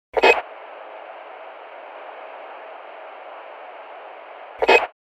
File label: CB radio walkie talkie turn on and off